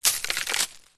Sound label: foley wood chippings handful throw onto pile; zapsplat